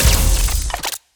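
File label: Short Laser gun Electron Impeller Fire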